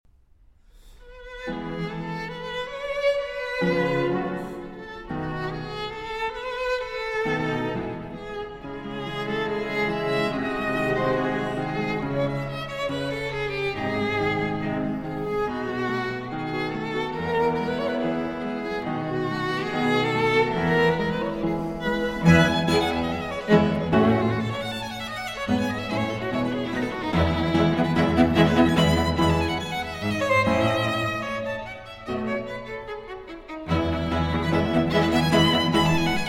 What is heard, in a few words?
Quartet in E Major, Allegro Moderato